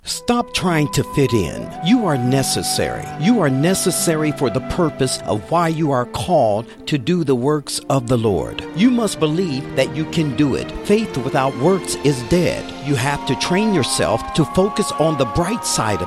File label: Motivational